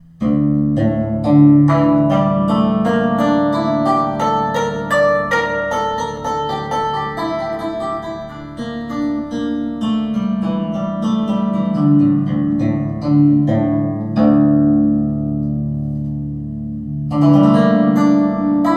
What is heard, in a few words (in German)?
Pentatonik Hemitonische Beispiel; Martin Kucera